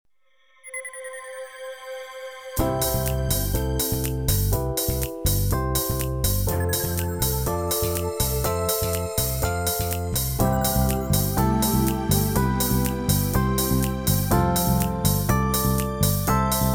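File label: C live track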